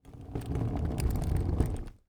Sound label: Household Briefcase Wheels Rolling